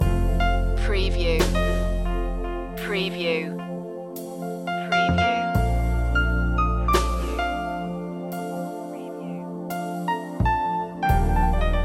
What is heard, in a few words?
piano jazz jam loop